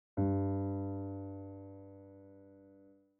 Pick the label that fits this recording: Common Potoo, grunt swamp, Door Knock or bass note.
bass note